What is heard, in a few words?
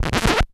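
scratch, record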